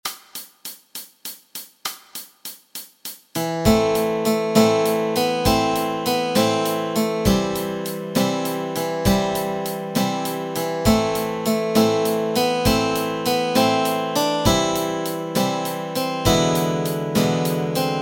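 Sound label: Melody and Chords Track, Easy Guitar and Ukulele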